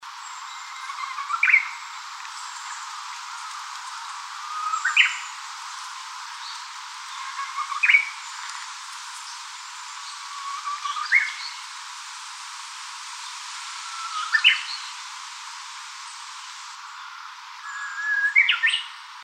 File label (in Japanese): uguisu